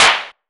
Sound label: Clap, Roland S50 drum machine